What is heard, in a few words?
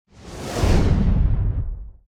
whoosh space ship; NCH Library